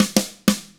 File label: HARD ROCK, Drums; 96HARD ROCK